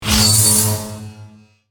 Charge; Raiden

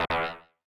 error; Beyond Oasis

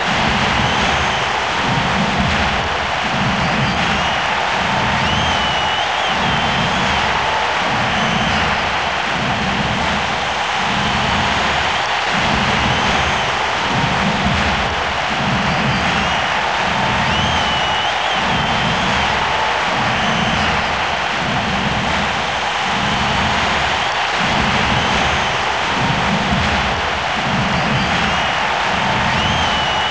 chant; Strikers

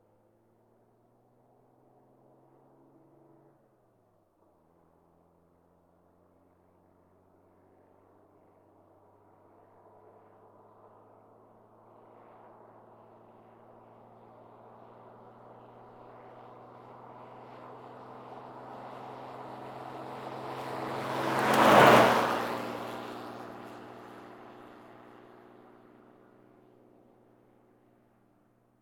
truck military; SourceFM

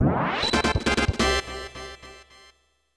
Unused Jingle; BS Kirby no Omocha Hako Baseball

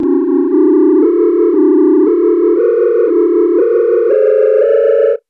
Super Scope Test Unused Jingle; SNES Burn-in Test Cart

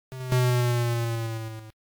big fall; Shantae

